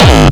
kick

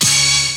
HIT SYN, ORCHESTRA HIT; Crypton - DrumBase5000

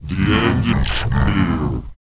PITCH MOD; ILIO; Vocal Planet VOL-4; R&B & Dance; SCI-FI CHZ

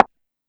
Tabla, Yamaha DD-20

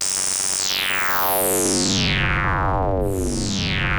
Moog F#2; Hollywood Edge; Giorgio Moroder Rare Synthesizer Collection; MLTI.MOOG

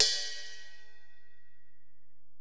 Ride, Alesis DM-PRO, Drum Machines

